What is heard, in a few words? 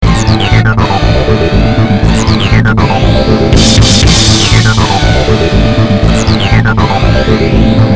depressing rock music